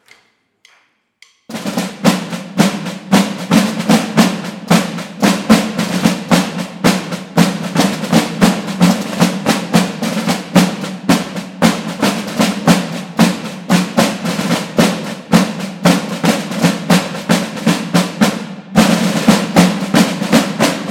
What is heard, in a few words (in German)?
Tambouren Zugabe